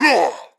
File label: Shield Attack; Pecheneg